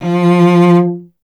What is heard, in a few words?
STR VC F 0, Cello; Miroslav Vitous; Solo Instruments VOL-1